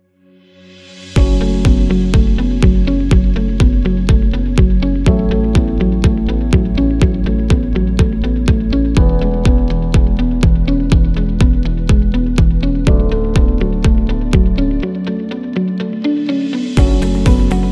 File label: Promo Corporate